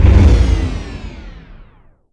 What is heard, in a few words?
Powerdown; Five Nights at Freddy's Final Hours 2